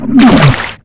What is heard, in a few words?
jelly die; Fate; MONSTERS; GEL